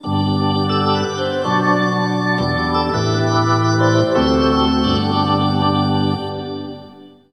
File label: Hockey Organ USA